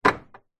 vehicles car central locking in garage ext nissan patrol